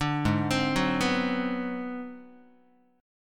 G sharp 7th Sharp 9th Flat 5th G sharp down Guitar, chord; G#7#9b5; arpeggiated; JGuitar